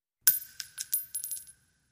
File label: Shell Case Sngle Tube, shell casing movement